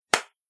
Slap Smack Sound; Slap - Smack Sound 2; Slap Sound Effects; combat; Human Body Sound Effect; Andrew Fleming

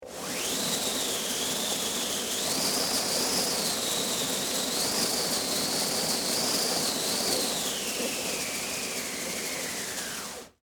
Wind Machine Spin, Long, Radio, Prop; Foley; Machinery Sound Effects

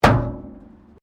METAL BIN IMPACT PLASTIC CRASH BARRIER

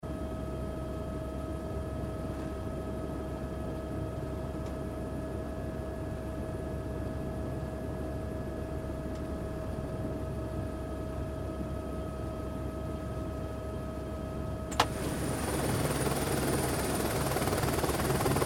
Helicopter Flying Window Open Shut Idle; Helicopter flying. Open window at 0:15 giving a more pronounc; Helicopter Sound Effects; Lynne Music

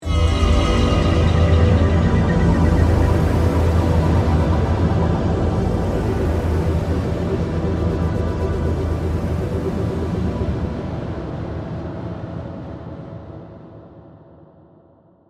Music, Sweeper, Synth, Dark, Dissonant; Synths (Sound Effects:Musical); BlastWave

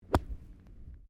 Golf swing wedge bunker; Sports; Various Golf sounds

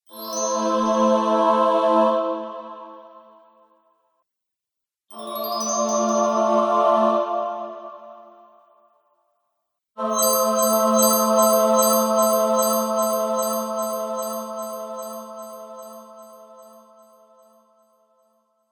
Treasure Discovery; Video Game Sound Effects; Game Sounds

